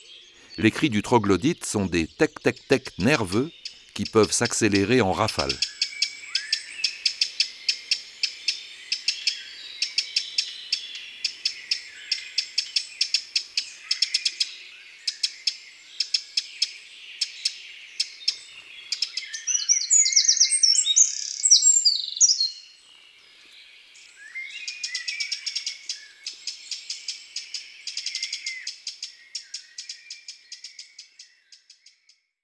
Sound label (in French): Troglodyte mignon